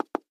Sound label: pepper shaker set down on table